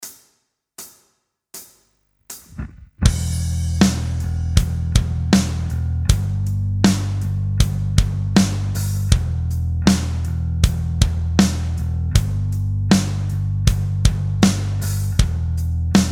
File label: Backing Track; acoustic guitar